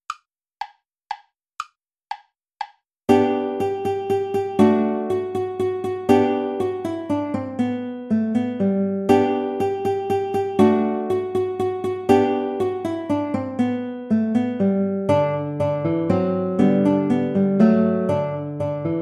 play along without guitar; old hungarian dance

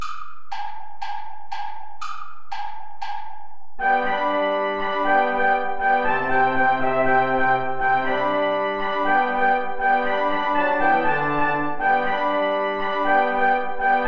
ipharadisi play along without trumpet; traditional

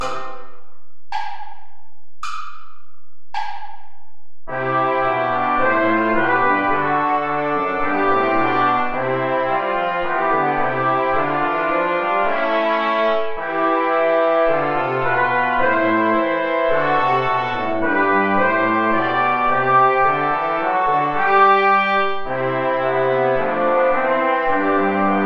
play along without trombone; lobet den herren alle die ihn ehren

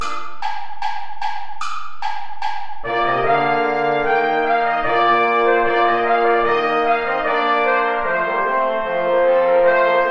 ballet play along without trumpet

